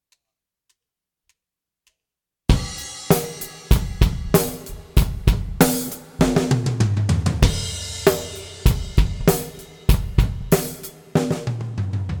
Maximize Mix, Drum Recording Setup

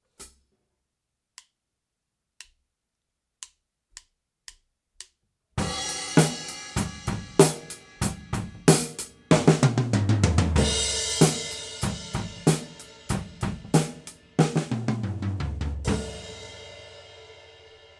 No Effect Overhead, drum recording; Drum Recording Setup

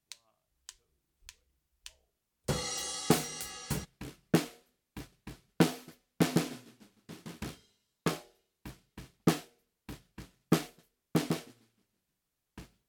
Comp Snare Bottom; Drum Recording Setup

